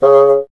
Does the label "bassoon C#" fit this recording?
Yes